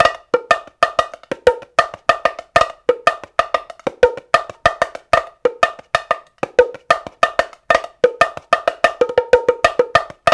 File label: 93 TONG, Bongo&Tonga; Spectrasonics; Supreme Beats; African Contemporary